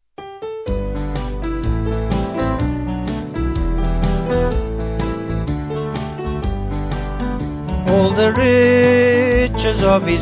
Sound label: Vocals Band